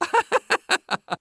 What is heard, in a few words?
singing